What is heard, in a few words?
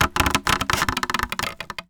Polystyrene Creak